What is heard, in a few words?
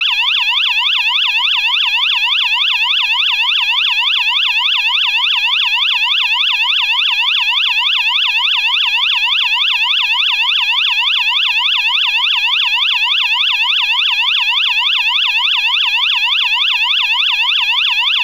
POLICE CAR, Piezo Siren